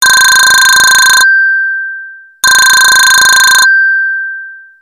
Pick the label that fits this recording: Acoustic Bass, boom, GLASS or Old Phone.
Old Phone